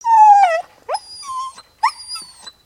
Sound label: Pet Doberman Whine; creatures pets